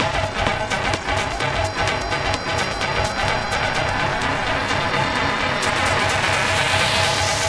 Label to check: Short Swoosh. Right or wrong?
Wrong